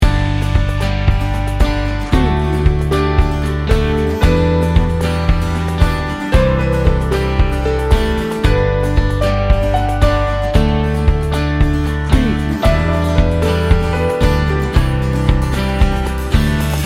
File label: inspiring uplifting soft pop loop